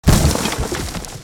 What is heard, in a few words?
Crate Smash; destructibles